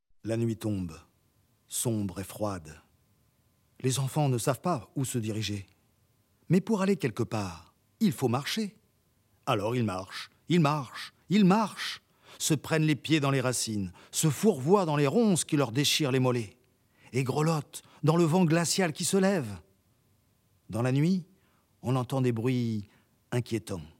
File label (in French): ensemble vocal variété jazz; chant du monde